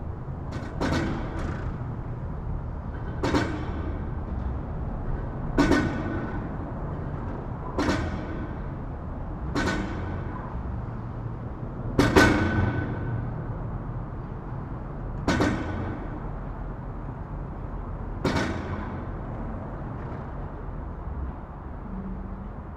Urban traffic moderate elevated metal plate echo; urban and suburban; boom library

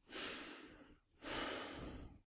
Breathe - Half breathless; Eden; RadwinIsland

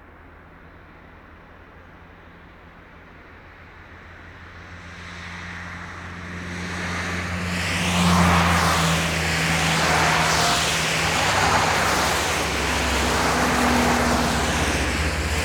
car drives wet; best service Blue Box

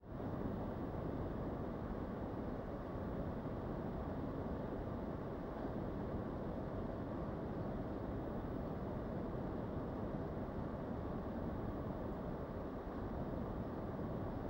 air condition; devices accessories utilities; NS Studios - ultra sound collection

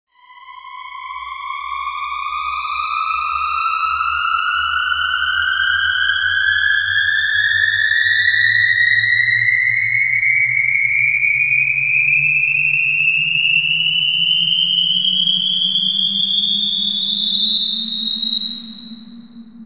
Screamer Jet Medium; RoosterFX

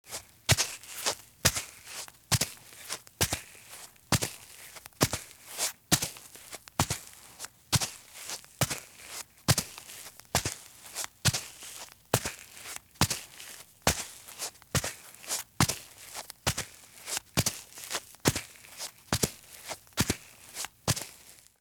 Flip Flops Walk at Medium Pace on Sand; Sound Ideas; Footstep Sound Effects

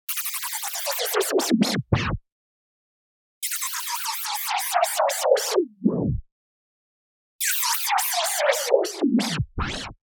wobble, noise spin down; boom library; Anime Essentials; DSGNMisc

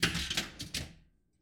Pinball Ball Launch

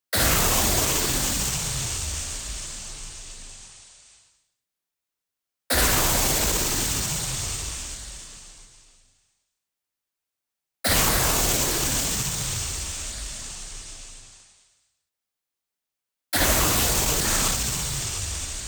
IMPACT WHITE NOISE Noise Storm; boom library; Anime Essentials

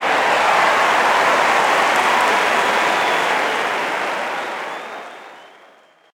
Crowd Cheer; Pitch Black Audio Pong

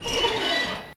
Squeak Wood Porch Swing; SFX Kit

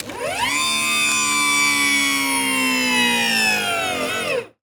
Stretcher Lower Bed; Medical; Blastwave

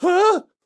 histeric short; donnie; Blind Drive

